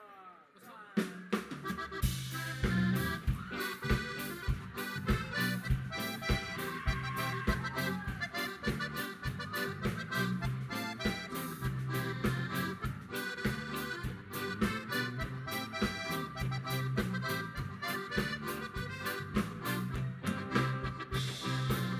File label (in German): Timple Boarischer